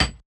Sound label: perc